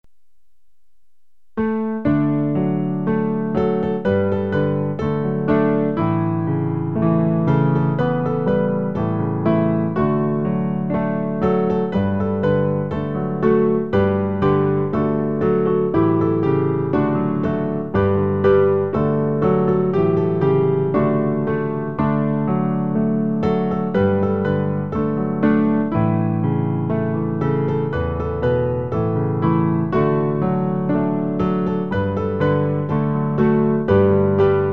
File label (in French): piano; Quelle grâce!; Pour accompagner le recueil Louez Dieu.